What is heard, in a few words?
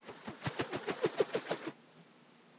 Twirl, organic wooshes; Micro Pack